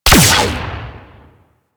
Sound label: Cluster Shot Fire; Enemies; Abilities; Warframe; Teralyst; Bigalyst